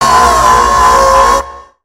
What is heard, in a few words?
Robot Stunned; creatures mechanical; Lego